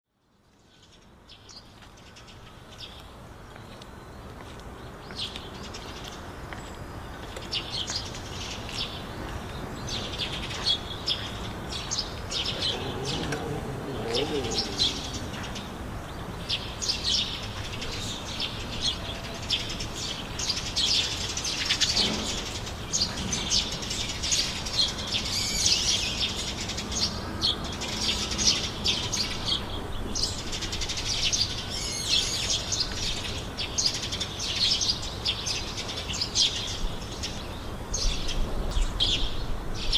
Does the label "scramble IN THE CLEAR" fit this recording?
No